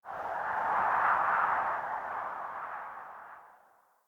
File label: Prairie Day Wind Gust Soft